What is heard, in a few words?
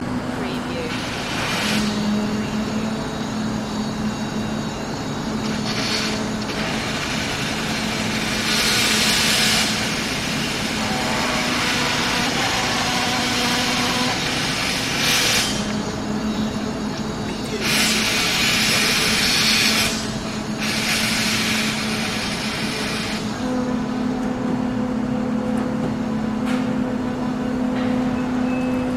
nighttime construction noise; loop